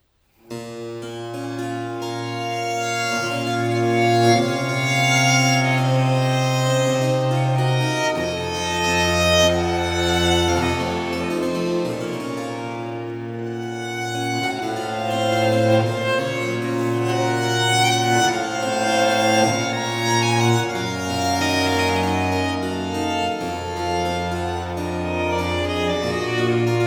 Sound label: Adagio; Trio Sonata in D Major; TWV 42 D13